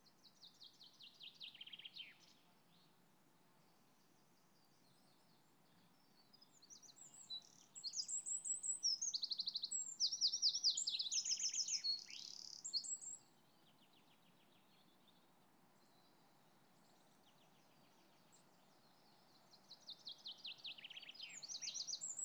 soft wind chaffinch wren bees, Woodland Atmosphere; Mindful Audio